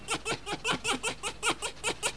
Vulpes vulpes cackle

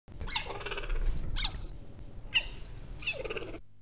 Acinonyx jubatus courtship

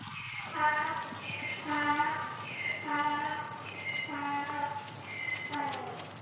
Equus kiang

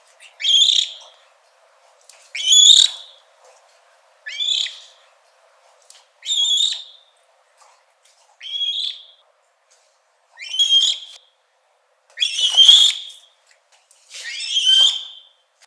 Grus leucogeranus sad

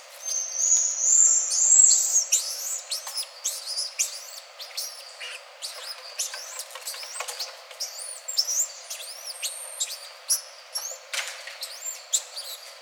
Saguinus midas play

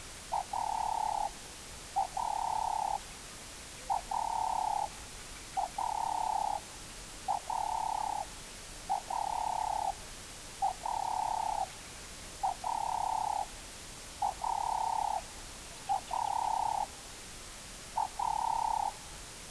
Streptopelia capicola cooing

